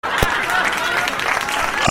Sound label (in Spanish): Aplausos